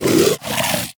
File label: vocal breathe; Warframe; Hunting Critters; Vulpaphyla